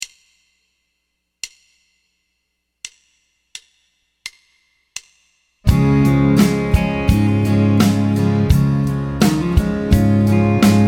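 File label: Triad Vamp major Db; Piano Practice Tracks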